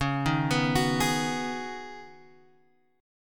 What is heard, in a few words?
C sharp 7th Suspended 2nd Suspended 4th C sharp down Guitar Open F; C#7sus2sus4; arpeggiated